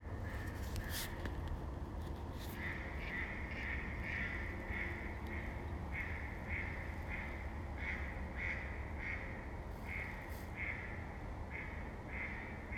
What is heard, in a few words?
mallard